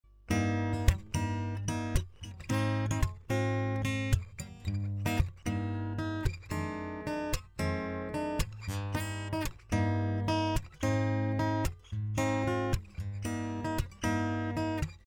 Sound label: 114ce DI Fingerstyle Perc; LTD